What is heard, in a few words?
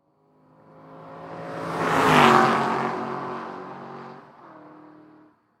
Aston Martin Pass By, Transportation, Automobile, Pass, By, Fast, Right, To, Left; Aston, Martin, 2008; SoundEffects-BlastWave